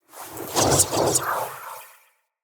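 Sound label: Staff Caster Projectile Whizz By Heavy; Weapons; Player; Staves; Starter Staff Caster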